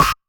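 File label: Flange Snare; Roland SH-32; Drumset 04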